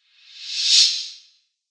Thin Reverse Whoosh; Whoosh Sound Effects

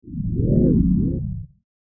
Slow Subtle Wave Whoosh; Andrew Fleming

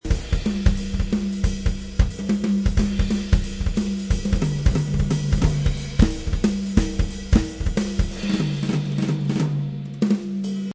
Jazz drum solo at 180 bpm.; Jazz Drum Solo Part2 Loop 180 bpm; Drum Loops

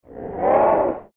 alien creature growl; Aliens; McKinney Sound

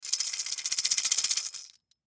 Tambourine Wood; Tambourine Wood Shake Fast; Rattle Sound Effects; Blastwave

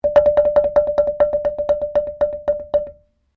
GAMALAN BAMBOO TREMELO, Indonesian Gamalan copy; Sound Effects:Musical; Foundation3

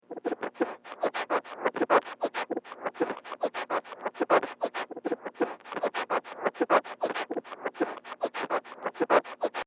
100 Bpm Vinyl Beats Reverse Loop; Royalty Free Drum Loops; Percussive And Rhythmic; Seamless loop

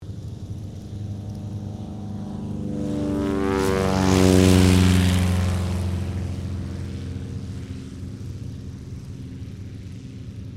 AIRCRAFT CT4A TAKEOFF

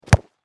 Soccer Ball Kick Punt; Sports

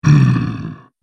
Zombie, horror; Zomby

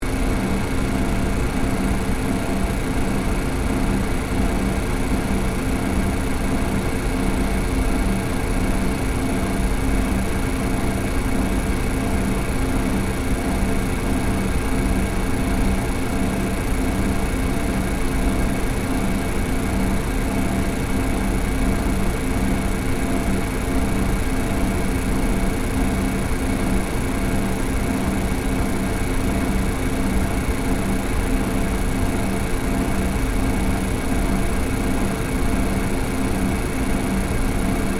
Industrial Fan Motor, Run, Loop; Factories; BlastWave

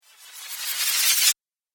Spell Fast Then Stop, magic sound effects

